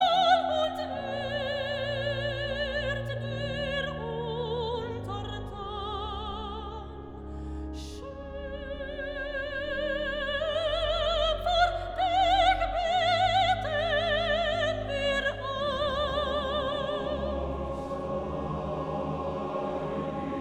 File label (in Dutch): Koor (mannen); Mastreechter Staar